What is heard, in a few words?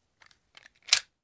Firearm cocking sound